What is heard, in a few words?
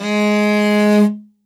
MORIN KHUUR, g#2, BOWED INSTRUMENTS; World Instruments 2; PS MORIN KHUUR